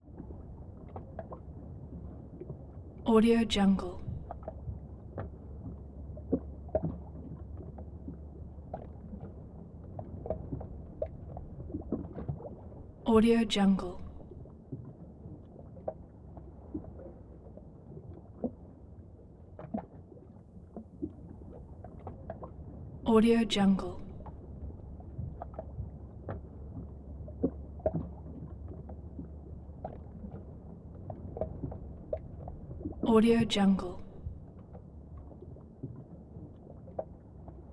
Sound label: water glub sloshy slapping side of boat